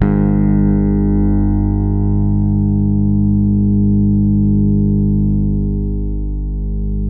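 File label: finger A 2, 8-string bass; Spectrasonics; Bass Legends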